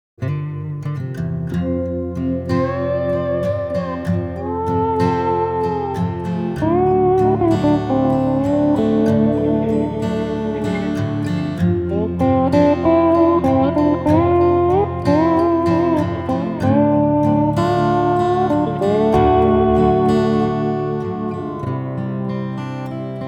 Acoustic Guitar

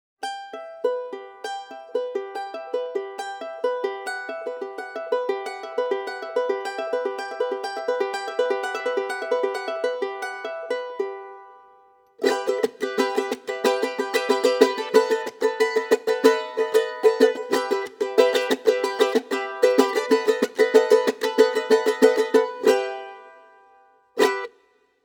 charango

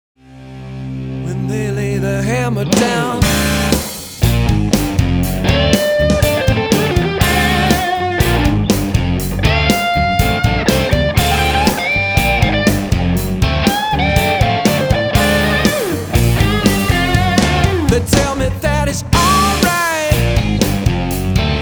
Electric Guitar Blues

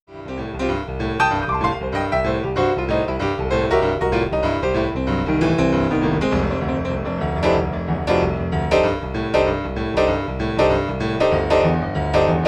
Piano Accordion B3

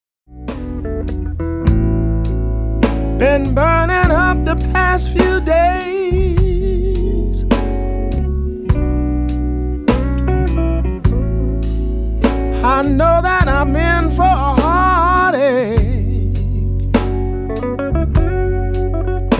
Vocals

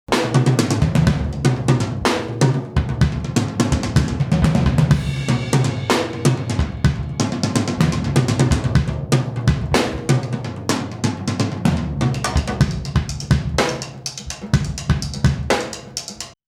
Drums Isolated

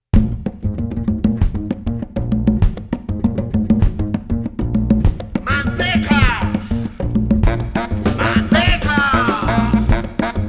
Brass

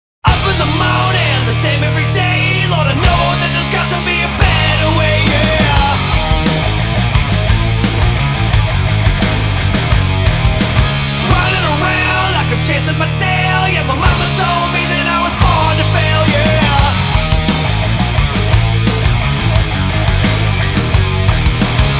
Electric Guitar Hard Rock

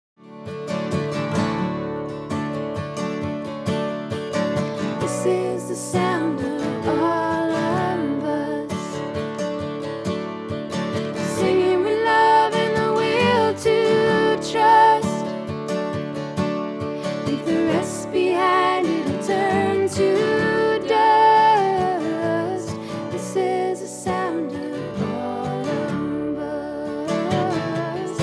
Vocals

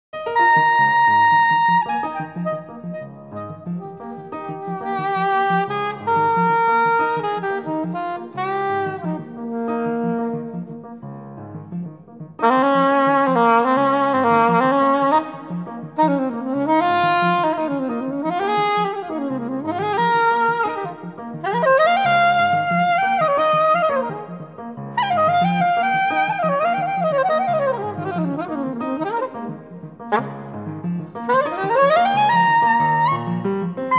Brass Reed

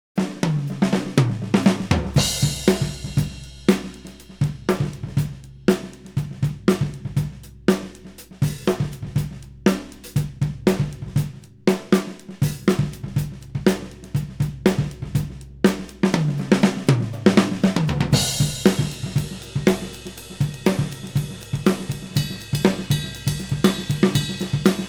Drums Isolated

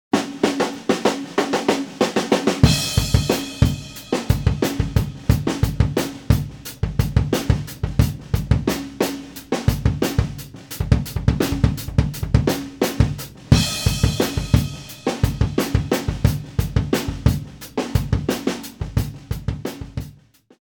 Drums Isolated

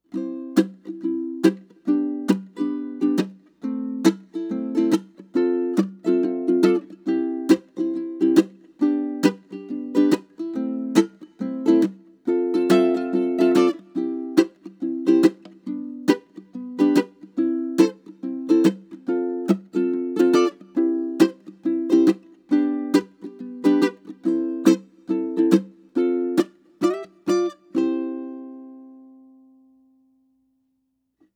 ukulele

